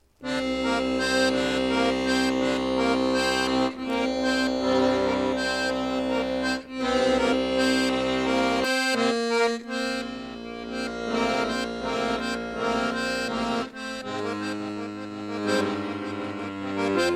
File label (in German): drehleier; krimilesung